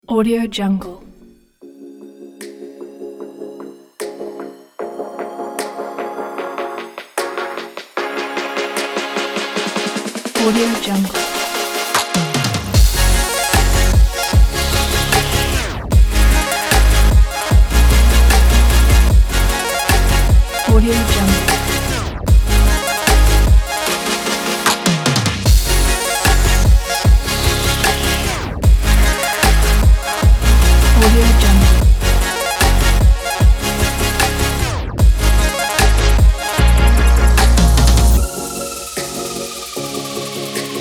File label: emotional future bass for sport